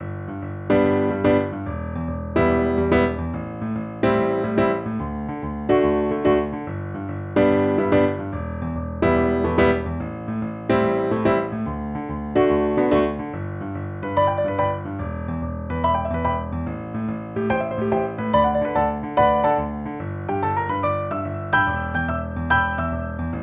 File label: Shuffle Exercise; Technical Exercises for Improving Keyboard Technique